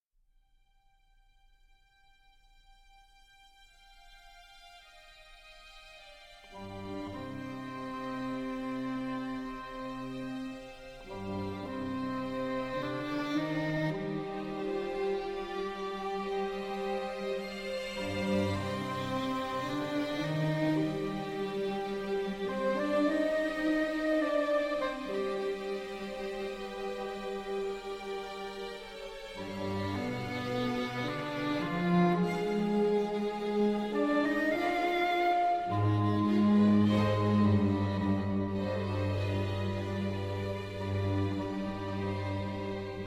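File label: Overture, Strings Brass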